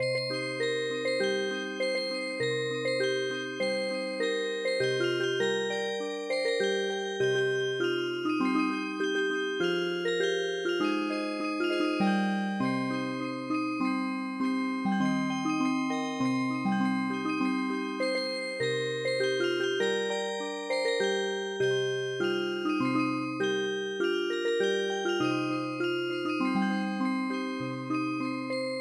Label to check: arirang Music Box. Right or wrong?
Right